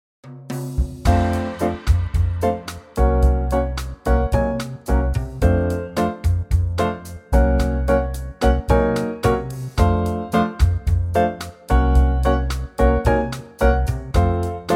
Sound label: F bossa